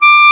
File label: KLARINET, Alpine Volksmusik; Best Service